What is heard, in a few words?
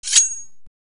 melee; melee examination(?)